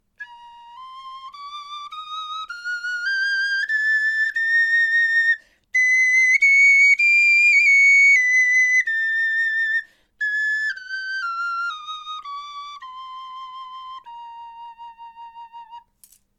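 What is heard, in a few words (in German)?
tonleiter, knochenflöte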